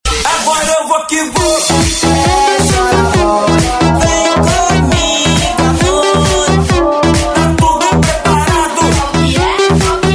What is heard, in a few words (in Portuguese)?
especial eletro funk